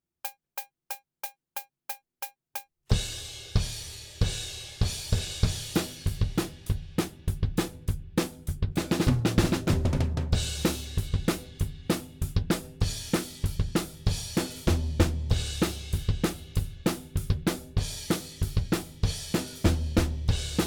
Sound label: Drums ONLY; Pre Pro